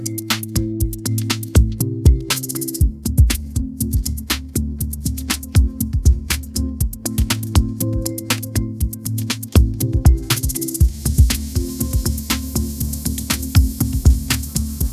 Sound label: upbeat pop melody, ringtones; ai